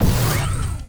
peace damage; NECROMETA; Mob

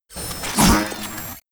CUTTER WAR ATTACK; Mob

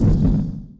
Collision

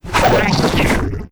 WAR ATTACK, Mob

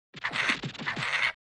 YOUNG FLEM PEACE IDLE

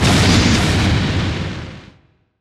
launcher

